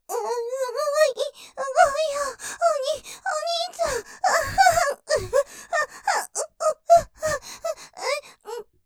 喘ぎ; すごすぎて、お兄ちゃんと何度も読んじゃう系; ステレオ

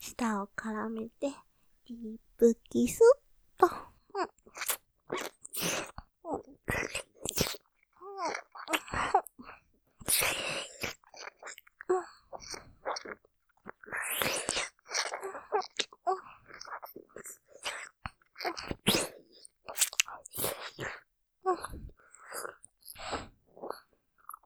キス 舌を絡めてディープキス; モノラル; ループで楽しむ！シェフの気まぐれ抜き抜きコース

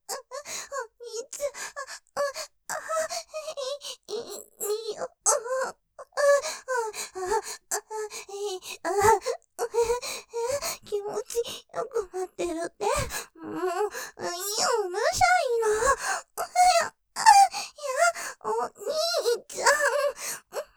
喘ぎ 気持ちよすぎて 甘えちゃう; ステレオ

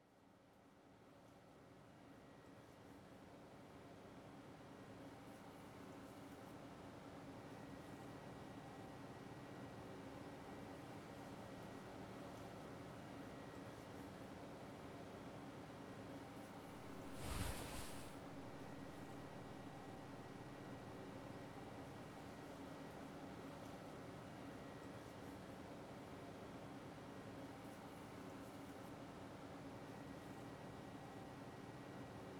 耳かき（左）ASMR; ご奉仕らぶらぶ耳かき（左）

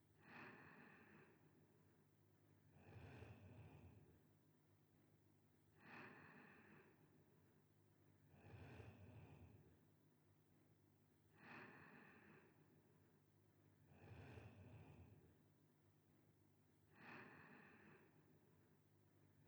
寝息トラック, ASMR

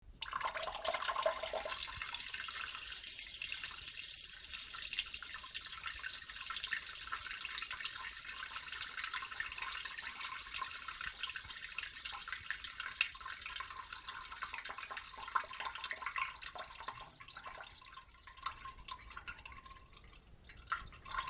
ちょぼぼしょーおしっこ

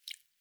しぶき 短, ぴゅっ 短め

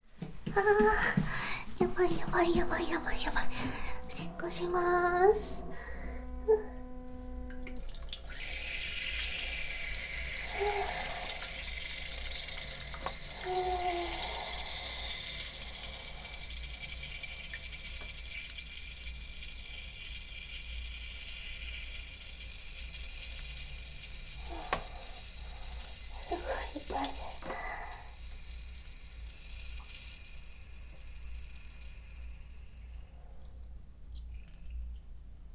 お家でおしっこ我慢！もう限界！かけこみおしっこ！ 放尿目ですッ！, ASMR

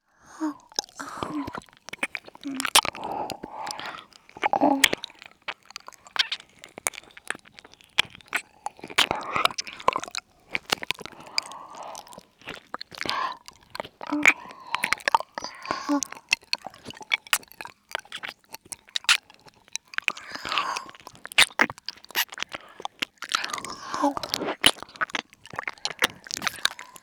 耳舐め エンドレス, ASMR

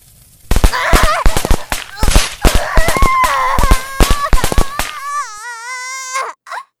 足に埋め込んだ爆竹起爆

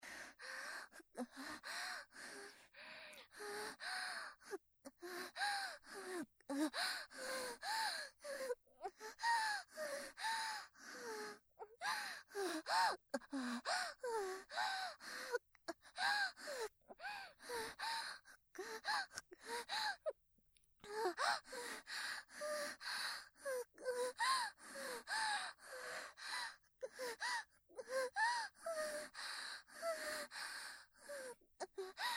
呼吸音ボイス

